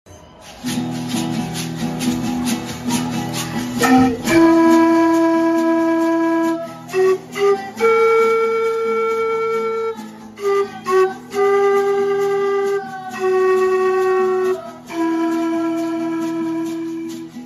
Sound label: Pan flute